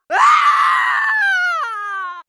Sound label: fall scream; mk9; sindel